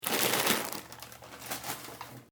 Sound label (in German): Plastiktuete zusammenknuellen